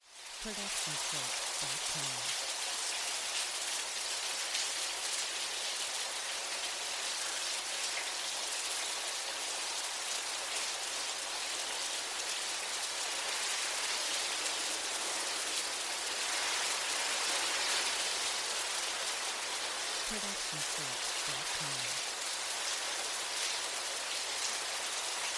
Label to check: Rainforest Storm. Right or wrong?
Right